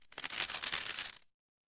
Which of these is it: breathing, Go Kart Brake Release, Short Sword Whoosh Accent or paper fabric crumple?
paper fabric crumple